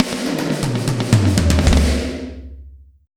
120 BREAK, DRUMS

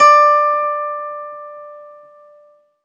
ZITHER, Alpine Volksmusik

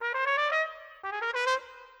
Trumpet, Orchestral; Sonic Foundry (Sony Creative Software); Orchestral VOL-2; Modern 11

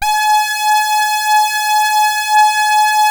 SAW RES WET, SYNTH LAYER, Synth and Organ; InVision Interactive; Keith Emerson

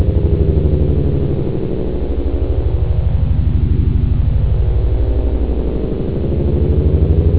sportybass; labrat; jazz jackrabbit 2

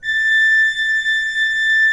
A5, Cathedral Organ; ILIO; Propeller Islands

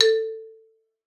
A3, xylophone; Xsample VOL-4

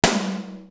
Tom, Drum One Shots; Coldcut's Kleptomania VOL1

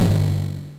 Timpani; Genesis

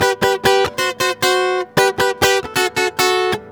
merengue, World Grooves; Best Service ProSamples vol.14; AKAI

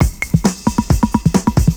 PERCS, 70 s Breakbeats

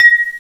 BOWL, BELLS; FAIRLIGHT CMI IIX

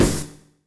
HIT 4 FX; Bolder Sounds - Granular

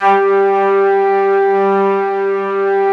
ST ORG, STRINGORGAN, Yamaha VP-1; Music Store; 90's Sample CDs

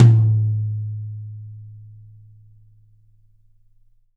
YAM 9X13, Drums; Big Fish Audio; Ross Garfield Drums VOL-2

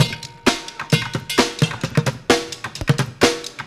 Street Groove, Ambient Grooves

